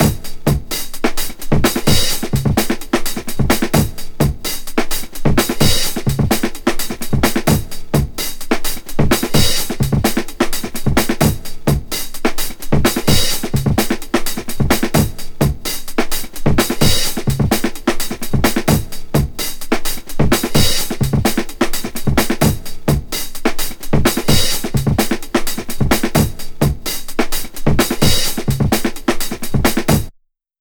128.5 Jazzy Bins, Breaks; Lockdown Breaks

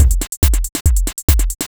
Beat, Drums & Percussion; Electrocution; Beats

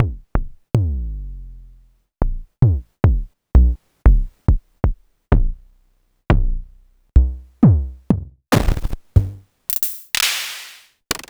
Formanta Radio Plant Polivoks set

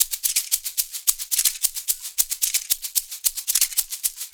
Shaker, Percussion Loops; Ambient Grooves

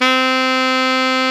SAX XALTOS, Sax Sect.; Saxophones

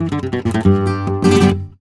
Guitar, Flamenco, g; Best Service ProSamples vol.46 - Flamenco; Frase

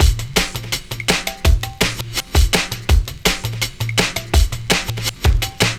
Break, Loops; M8

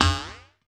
boing; Spectrasonic Distorted Reality 2; BEMUSED

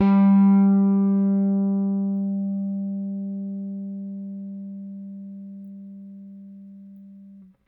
RickThumb-B, RickenbackerBass; Airwindows

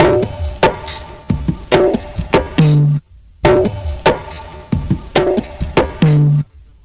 lowdown dirty conga, 70bpm; Zero-G; Freak Beats

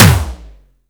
sfx perc

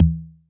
Electric Tom, Yamaha DTXpress; 300 Drum Machines

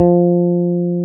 BASSES, Roland

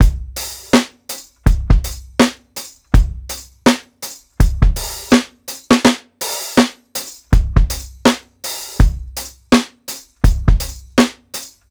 82 SWUNG OFF BEAT, DRUMS LOOPS; BASEMENT DRUMS VOL 2

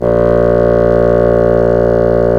Bassoon A#1; WND Bassoons; Roland L-CDX-03 Disk 1

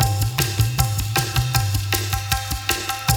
ETHNO LOOP, BREAKBEATS; Crypton - DrumBase5000; BREAKBEATS#4

